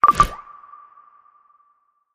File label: notification